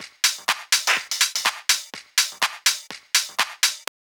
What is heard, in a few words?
house loop